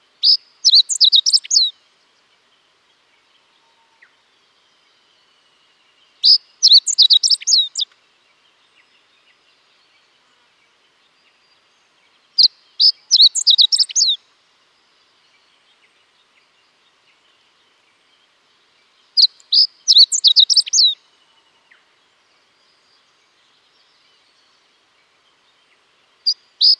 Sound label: hoojiro; ホオジロ; 2011年7月9日 新潟県十日町市